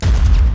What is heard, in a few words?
Deagle